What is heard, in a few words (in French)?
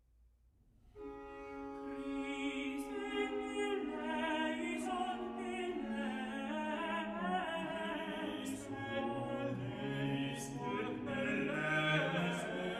messe de minuit pour quatre voix flute et violon christe